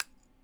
tap

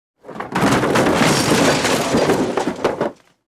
impacts debris; Asian Show Sound Effects

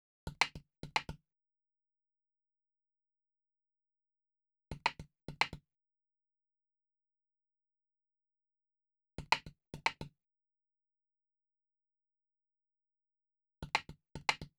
Small hand drum; War Cry (Cayovinto)

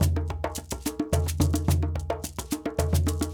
Djoun, Djembe, Shaker

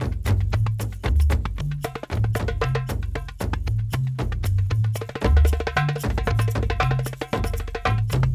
Kendong

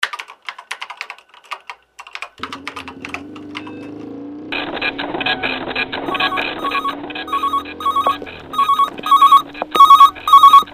habitats

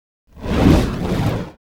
fire flame woosh; Asian Show Sound Effects

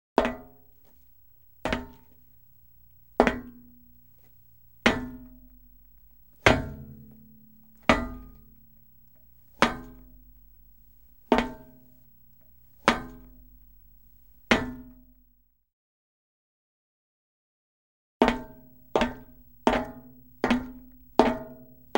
steps steel slow; Asian Show Sound Effects

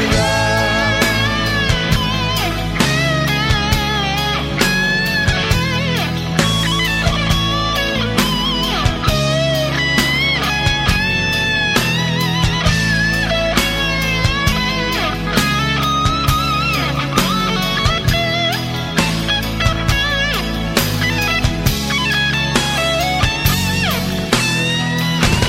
tranquil song

